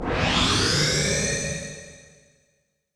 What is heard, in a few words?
change star fx, weapons